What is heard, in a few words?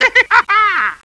shoot; m1887xmas